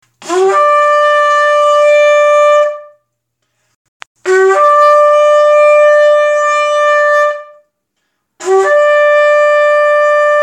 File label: shofar